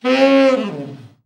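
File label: TENOR overblow FALL, Sax; TEN-OVB FALL; Giga Samples Collection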